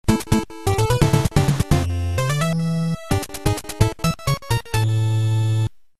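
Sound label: game over classic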